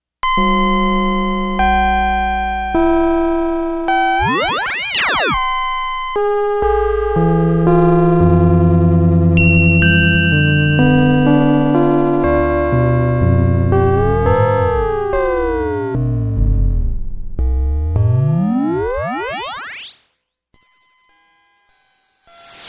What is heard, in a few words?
Resonance Bells